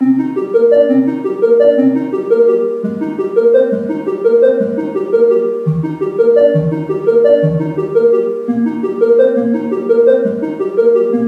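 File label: synth loop still kids 170 C minor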